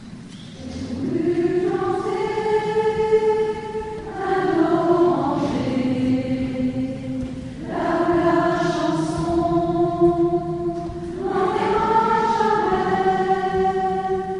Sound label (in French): Ballade nord-irlandaise